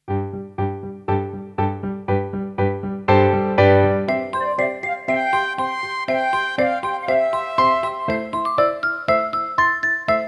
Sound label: Tendu jete, Ballet